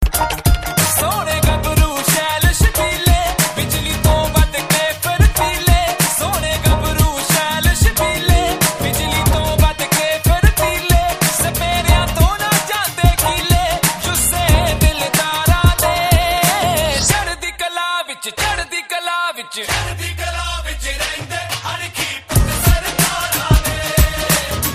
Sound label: punjabi remix